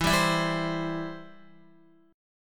E-Minor Sharp 5th, Guitar; x,7,7,9,7,8; Em#5 strummed

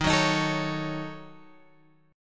D sharp Minor 7th Flat 5th Guitar Open G; 13,11,13,14,14,x; chordshape

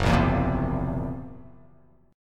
F sharp 7th Flat 5th, F#7b5 chord; strummed; JGuitar

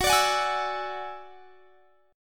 F sharp 7th Suspended 2nd Sharp 5th; F#7sus2#5 strummed

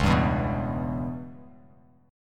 Db Minor 7th Flat 5th Db down Bass Standard; strummed; JGuitar

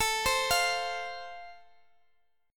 A Minor Sharp 5th, down, Guitar, Standard; arpeggiated; JGuitar

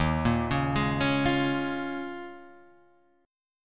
Eb 9th Suspended 4th, Guitar, Half Step Down

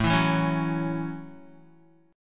A 6th A Guitar, chord; 4,7,4,6,6,x; strummed

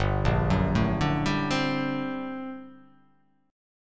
G Minor Major 11th, G; GmM11 arpeggiated; 3,1,4,2,2,2,2,x; JGuitar

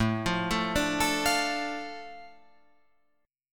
A Suspended 4th Sharp 5th, Guitar Open F; Asus4#5; arpeggiated; 9,12,9,9,12,12

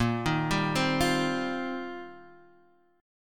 C Minor 11th; chord shape

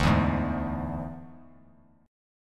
D Suspended 2nd Flat 5th D, Bass Standard; x,5,2,1; strummed

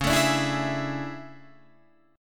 A Major 11th, guitar

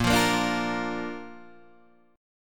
Bb Major, Guitar, Open D

